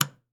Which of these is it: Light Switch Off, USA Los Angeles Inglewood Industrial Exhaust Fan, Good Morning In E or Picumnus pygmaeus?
Light Switch Off